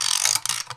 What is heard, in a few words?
winding clock; Politburo